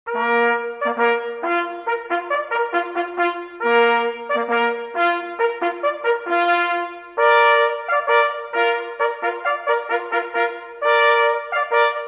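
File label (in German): Sau tot, Jagdhornblasen, Totsignale; Brauchtum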